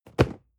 cardboard box medium fall low hard; objekte

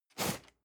cardboard box medium slide short hard; objekte

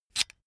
electric drill template adjust heavy in

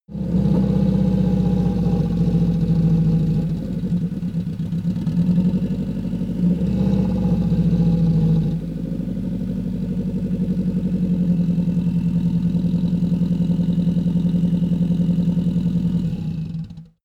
car vw t1 slowly arriving idle stop

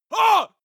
male attack groan; schreie; menschen

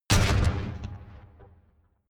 flutter echo

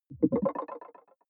button echo marble bubble; soundpool

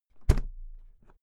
briefcase close hard

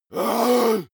monster growl attack deep; kreaturen

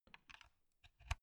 insert usb laptop soft, elektronik; objekte

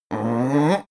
male grumble strain start; menschen; koerpergeraeusche; soundpool

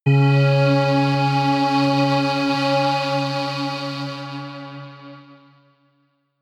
synth sway, drones

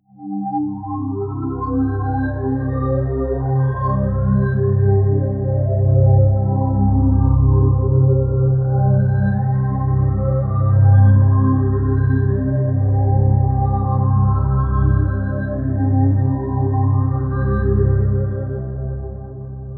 pad sweep underwater; flaechen

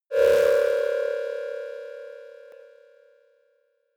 notification crackling mystery; signale

